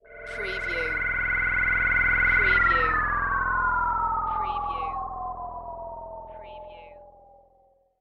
UFO Passing

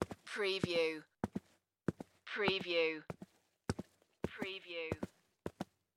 Footstep Tennis Shoe

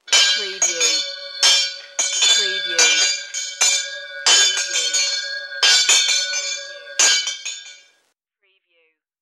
Bell School Antique

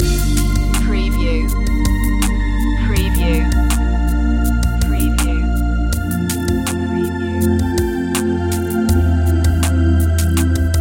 space wind loop

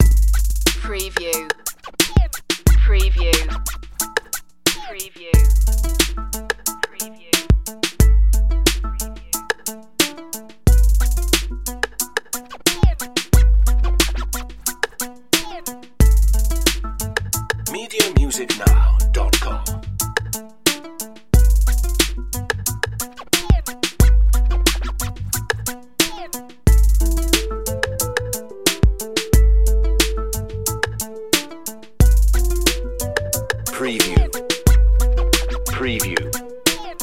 hip hop

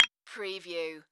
Multimedia Percussion

